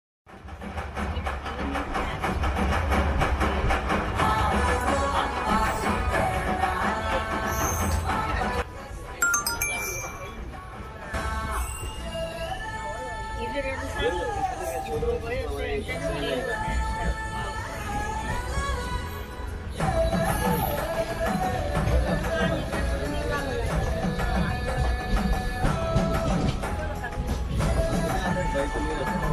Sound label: ambajipeta marriage band hero entry bgm ringtone; ambajipeta marriage band movie